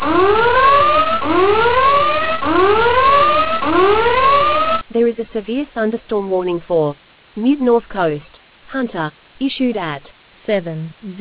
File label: storm audio